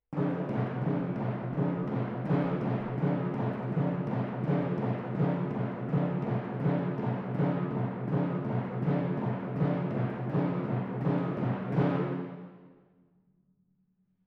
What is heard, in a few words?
Timp